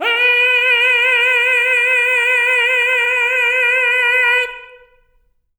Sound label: TENOR B4, Voices; Spectrasonics; Symphony of Voices